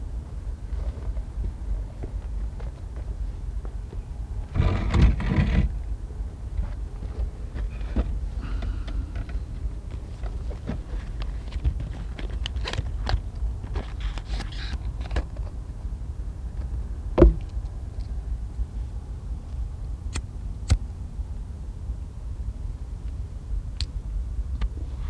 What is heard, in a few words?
Fag Break In Studio Garden